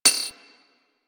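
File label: machine gun mech; Warframe; Archwing; Weapons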